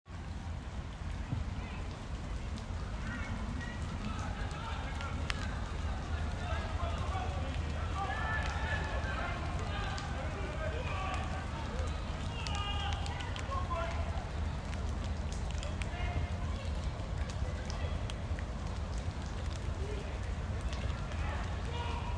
Zuiderpark soccer match, Rotterdam Field Recordings